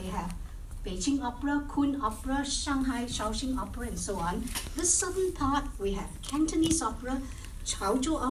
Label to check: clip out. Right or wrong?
Wrong